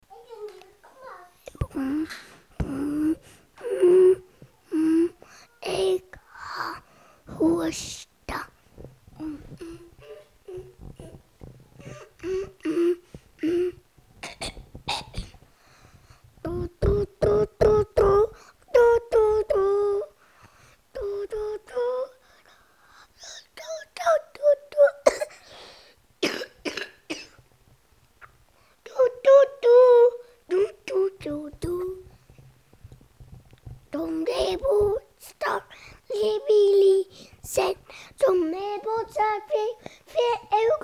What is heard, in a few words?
cough